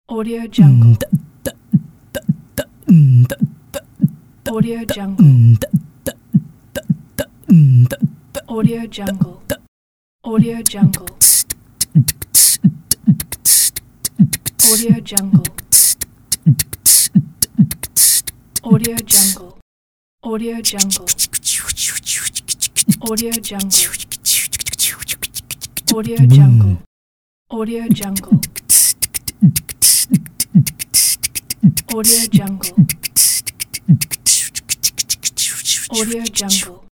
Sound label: beat boxing mania